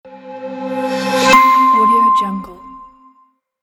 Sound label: opening logo intro